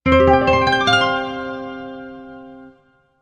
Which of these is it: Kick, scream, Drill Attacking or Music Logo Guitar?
Music Logo Guitar